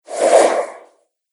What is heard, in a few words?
Swoosh Whoosh; Whoosh Sound Effects; Swish, Zoom, Swipe, Whoosh, Swoop, Transition; Andrew Fleming